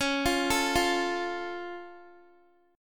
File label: Db Augmented, down, Guitar; arpeggiated